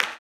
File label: CLAP, blues & soul; ILIO; Vocal Planet